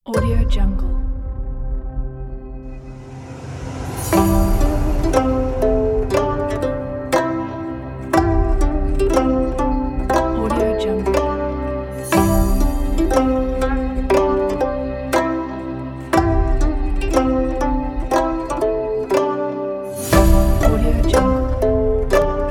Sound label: korean; wavemusicstudio